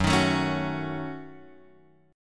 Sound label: Gb Minor 6th, Guitar Drop D